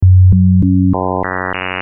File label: buzz